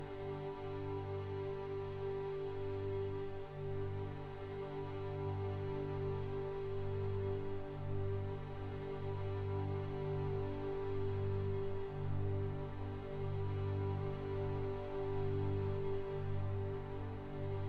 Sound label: Death & Rebirth Ending Pad